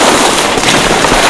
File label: SNW SKID 6; PC Gamer IT CD 32; mtm2trial